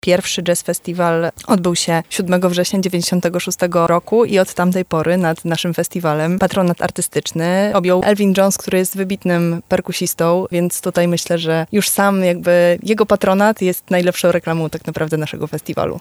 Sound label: jazz